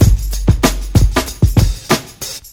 127 bpm breakbeat sample f sharp key, Free drum loop - kick tuned to the F# note.